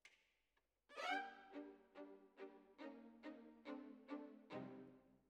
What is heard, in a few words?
Violins, jazz for piano